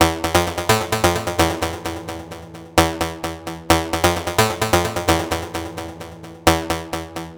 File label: Synth Loop 130 E, Techno; Loops; Synths; GhostHack; Ghosthack - Free Techno Sounds 2024